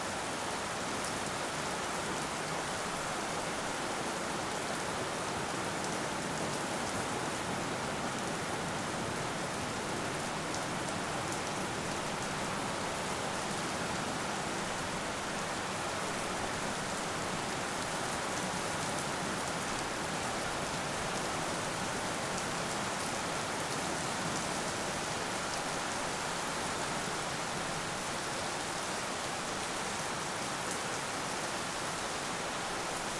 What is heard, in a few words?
heavy rain